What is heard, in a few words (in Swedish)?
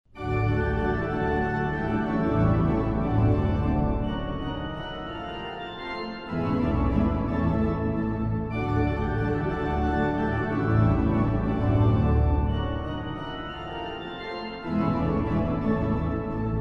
Vivace, D-dur